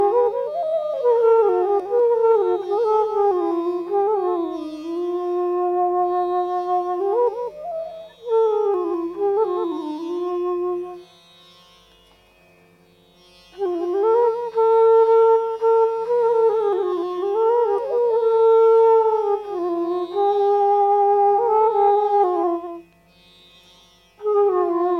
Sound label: Raag Zilla - Flute